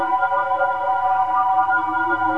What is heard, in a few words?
Resonance